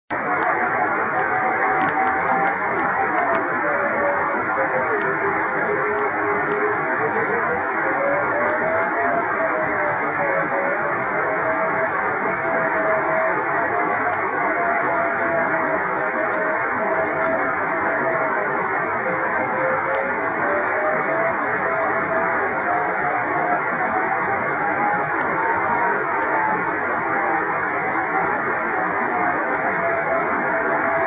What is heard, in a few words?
24G beacon; うすぐもり、気温２５℃ 湿度７５％; SSB:RST519; JH1UGF 横浜市; JN1AYV 茅ヶ崎市